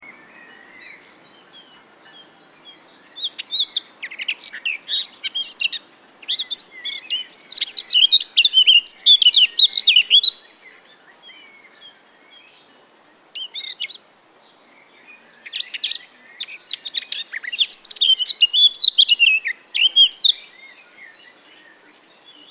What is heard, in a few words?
Sylvia atricapilla; SETE